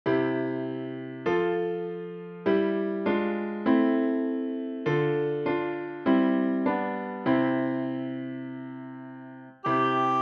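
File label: oboe; Alle Jahre wieder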